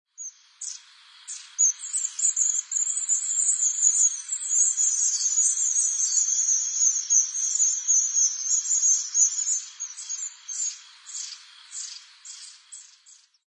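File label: enaga, Long-tailed Tit; hyogo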